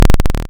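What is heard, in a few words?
waveform FM sawtooth sine envelope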